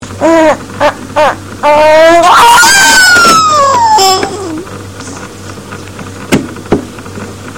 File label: squeek, baby; 8 mnths